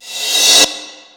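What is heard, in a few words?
Reverse cymbal, Yamaha SY22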